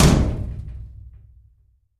Vault Inner Door Close; safes & vaults

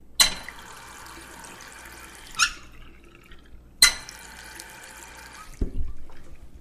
Faucet Squeak Bathr, water

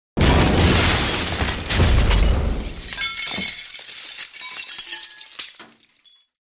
Large Impacts W Glass, metal & glass

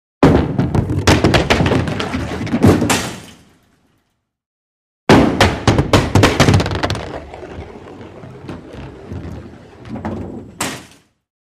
Gutter Ball Bowl, bowling

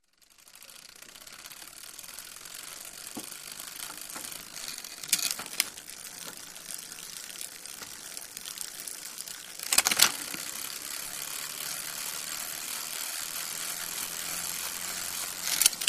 Bicycle Gear Chain

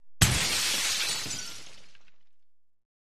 Glass Crashes Large W; windows & mirrors

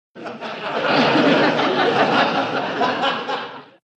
Crowd Light Laughter, medium

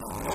zipper; zippers

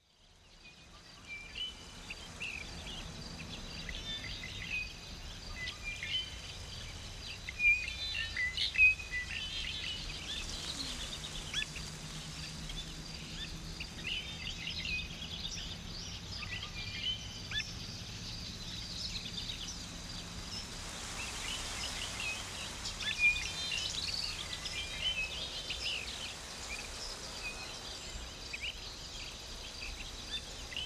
Forest Amb Exotic Bir, crickets

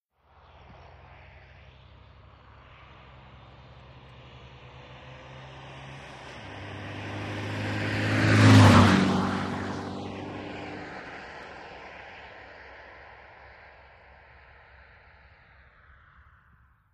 VEHICLES ASTON MONTEGO: EXT: Fast pass.

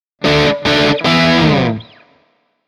Rock Guitar, electric guitar

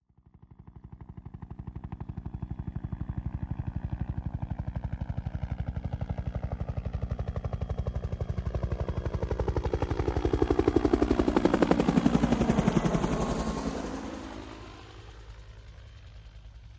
UH1 Huey By Slow Speed

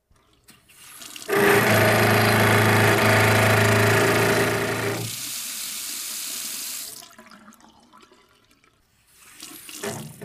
Water Pipe Faucet On, splashes

